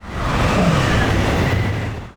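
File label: ambience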